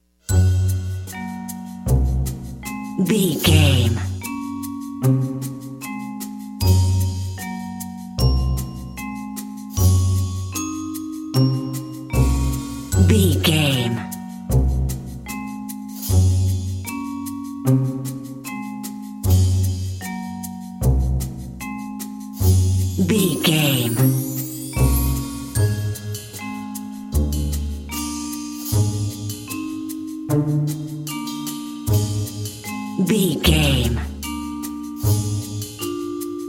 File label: silly oaf narrative, silly, circus, goofy, comical; innocent, naive, child like, childish, Sitcom, Dramedy